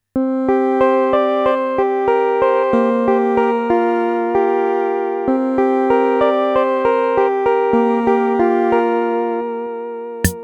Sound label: Casio MT-400V One Take Performance